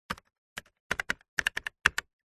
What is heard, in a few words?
foley cash register electronic buttons press sequence; zapsplat